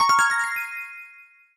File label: NES Remix - Stamp